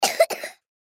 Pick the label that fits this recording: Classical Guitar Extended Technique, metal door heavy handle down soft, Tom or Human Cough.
Human Cough